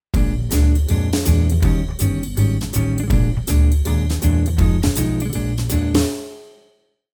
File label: guitar pattern